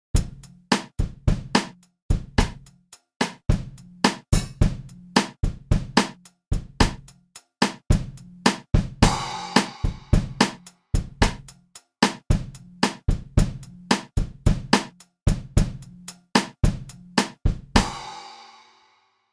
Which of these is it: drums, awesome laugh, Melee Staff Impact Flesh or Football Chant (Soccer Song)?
drums